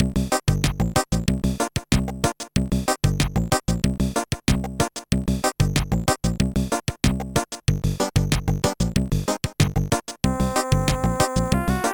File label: chiptune